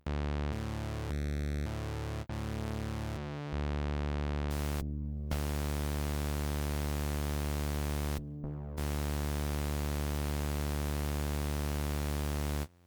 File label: D drone